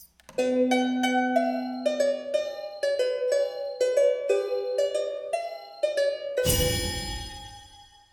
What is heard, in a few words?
Harmonic shield; Dark and Darker